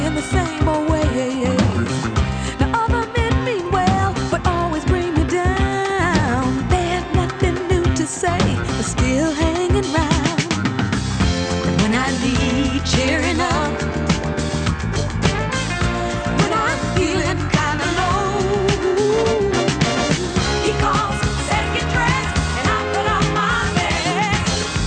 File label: disco